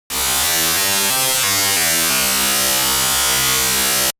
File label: Synth Subtractive Comb